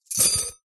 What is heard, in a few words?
household cutlery handful set down on soft surface; zapsplat